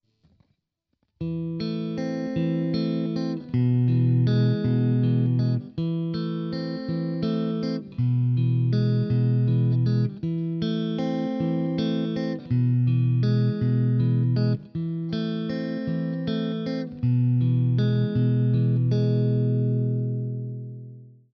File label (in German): Zupfmuster, gitarre